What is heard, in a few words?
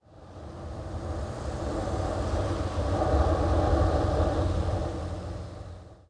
WIND, wind; zones